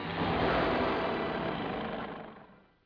roar; Tasha; Scarface

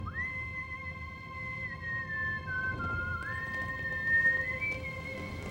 whistling; BSG2000; Final Cut

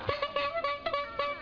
horn; Misery Loves Company

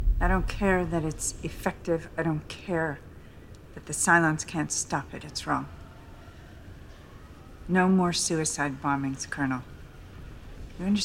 slap!; BSG2000; Precipice

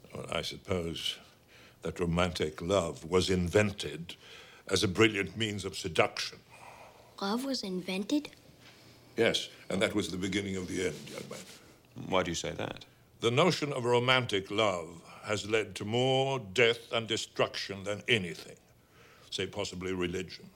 romantic love; Indiana Jones; The Perils of Cupid

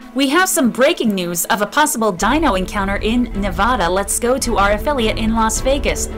breaking news; The Roar That Rules It All

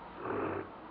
grunt; One of Our Pylons is Missing

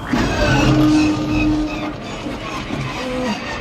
Millennium Falcon hyperdrive; Terminator; Rise of the Machines; Star Wars: The Empire Strikes Back

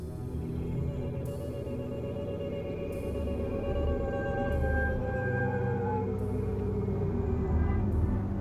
Indian whooping sound; Twin Peaks; Fire Walk With Me

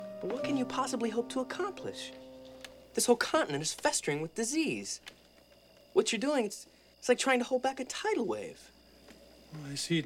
gathering pebbles on a beach; Indiana Jones

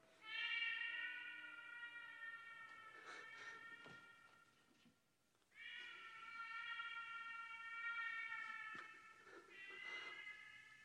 meow; The Fly; The Fly 1958

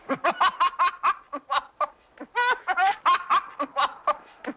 laughs; Baby Sitter

